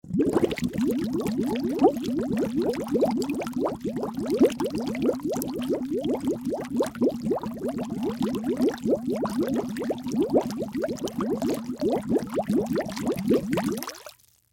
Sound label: bubbles